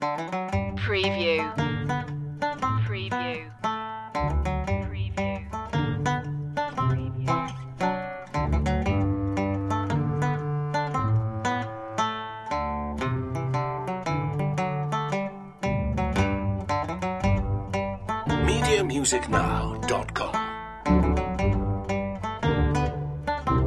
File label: the banjo man